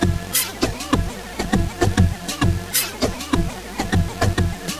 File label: Robo Beat 100BPM, sci-fi Beats